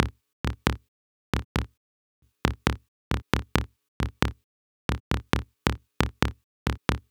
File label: Glitchy, Beats; Sonic Foundry (Sony Creative Software)